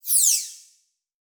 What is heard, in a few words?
Multimedia Internet CD-Rom Flash Whoosh Electronic